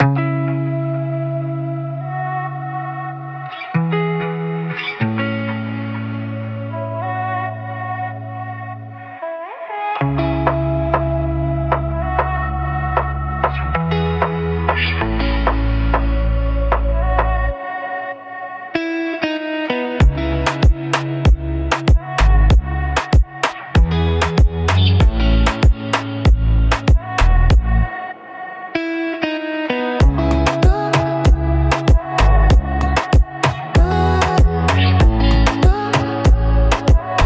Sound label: melancholic reggaeton glitchy sfx danceable; for podcast